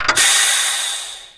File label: door move; Source Engine; video game